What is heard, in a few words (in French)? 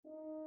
Adagio Pour 3 Cors; Ludwig van Beethoven